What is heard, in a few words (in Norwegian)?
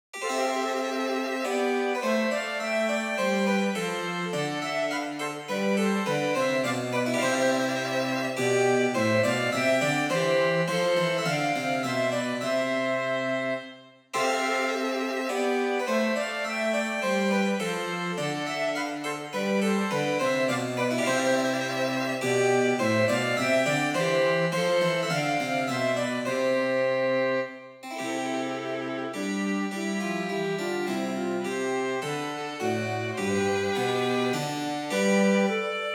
Menuet for fløyte, fiolin, cello og cembalo